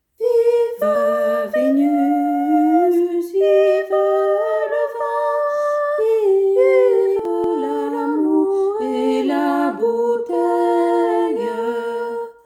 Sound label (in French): LES DEUX VOIX; vive venus